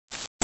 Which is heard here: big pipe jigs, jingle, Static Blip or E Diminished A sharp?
Static Blip